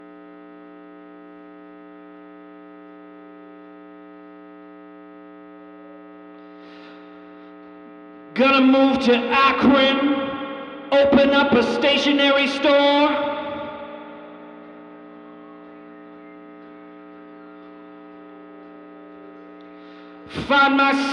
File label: VOX Vamp